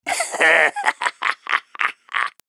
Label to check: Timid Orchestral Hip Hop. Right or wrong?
Wrong